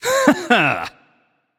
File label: yay; voicepack; smite; merlin